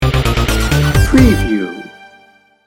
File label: push it sting bumper